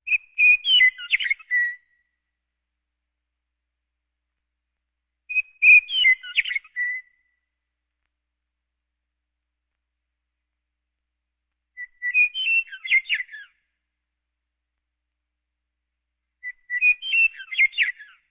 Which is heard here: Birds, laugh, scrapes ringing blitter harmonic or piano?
Birds